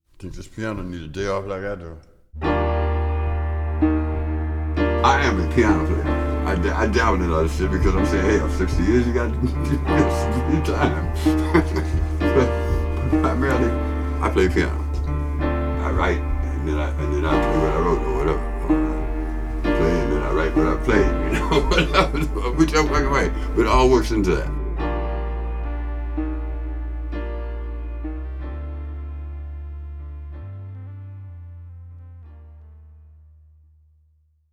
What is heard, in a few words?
Piano Player